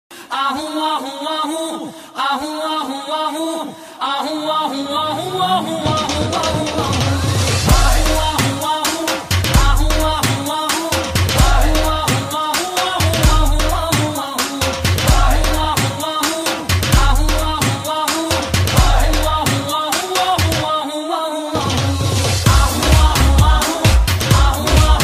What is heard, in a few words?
Ringtone, Punjabi